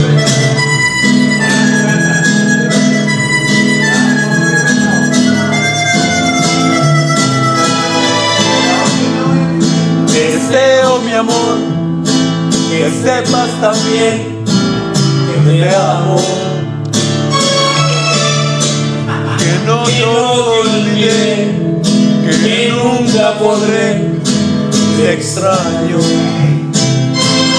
singing karokee

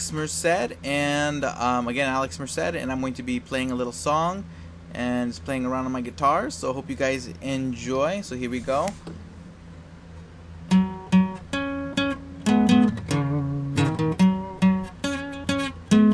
playing with guitar

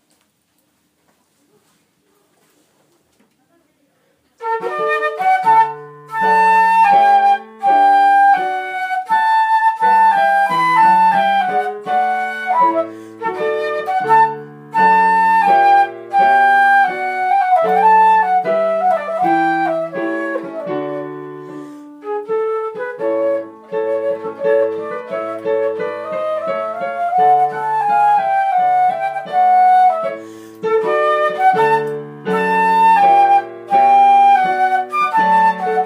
Minuet Trio Mozart

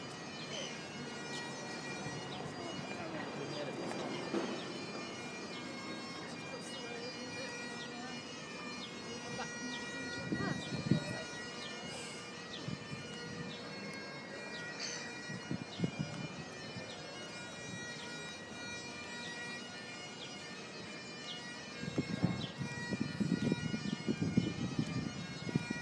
bagpipes

